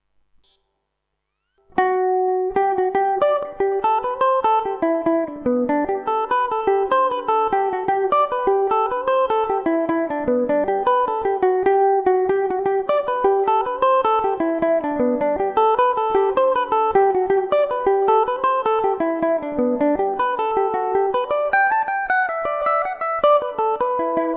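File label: G major revelation, Irish mandolin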